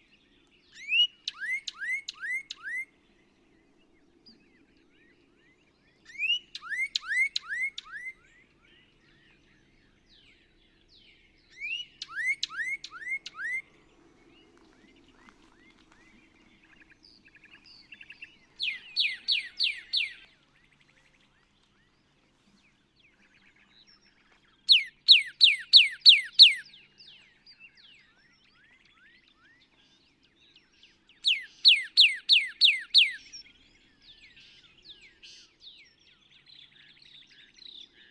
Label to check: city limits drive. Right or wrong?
Wrong